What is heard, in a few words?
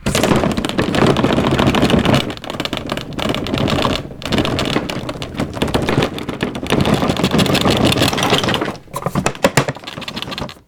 Sounds For Earthquakes Closet Shaking